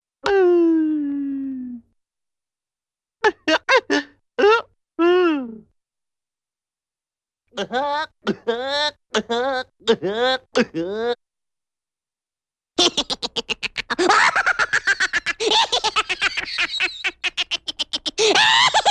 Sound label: Funny Descending 'goo' & Goofy 'gunky' Laugh, Cartoon; Hollywood Edge; Cartoon Trax